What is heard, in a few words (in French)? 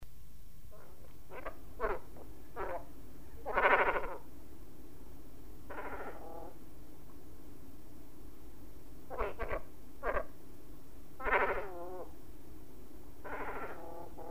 Cormoran, oiseaux; Sons Oiseaux